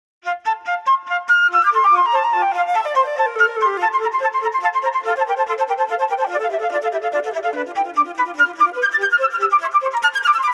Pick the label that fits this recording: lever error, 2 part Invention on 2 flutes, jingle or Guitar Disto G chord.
2 part Invention on 2 flutes